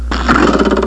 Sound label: Sproing; Grizzly 1.3.1